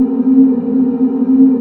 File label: pitch wind C4; Big Fish Audio; Synth City